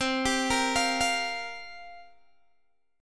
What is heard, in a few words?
C 7th Suspended 4th, Guitar, Open G